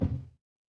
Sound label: impact thud